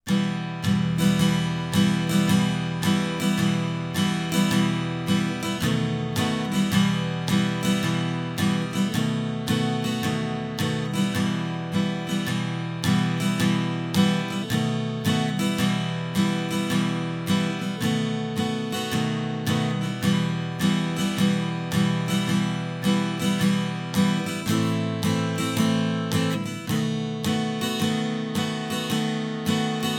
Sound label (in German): Swing Gitarrenbegleitung; Go down Moses